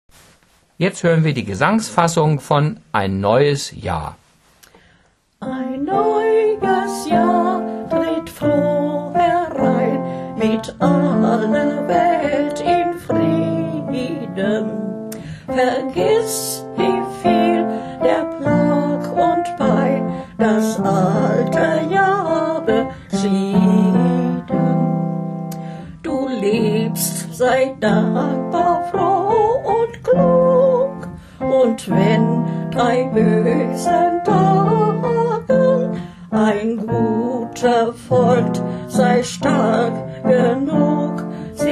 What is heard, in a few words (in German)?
Gesang